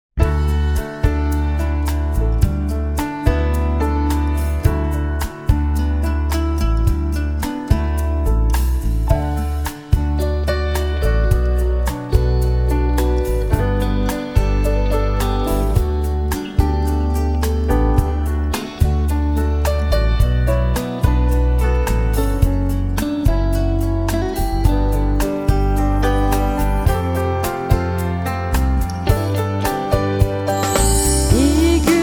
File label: jingle; Imperial Hastanesi Kurumsal Müzik